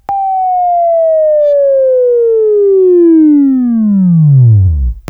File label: Buzz; Labview-Program; Sweeping Training program